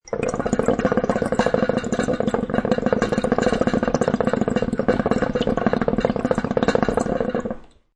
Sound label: Hookah, Smoking tobacco in a hookah water pipe; Human